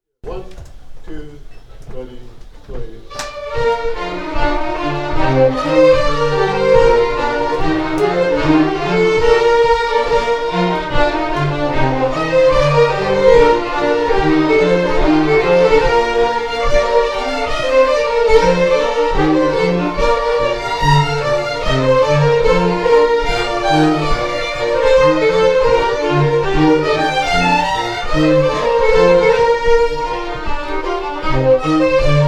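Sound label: Reel, Scottish Fiddling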